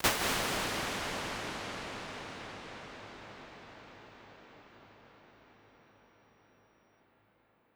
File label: Bigger Spaces Overmuch L, Impulse Responses; Ableton Live 12 Lite